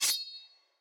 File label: minion sword; smite; npc; roman archer